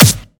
original acoustic snare g sharp key; snare drum samples

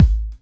rich lows bass drum g sharp key

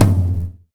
high floor tom drum one shot f key; tom drum samples

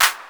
old school clap single shot f sharp key; Duration 0:01; Samplerate 44100 Hz; single hits one shots

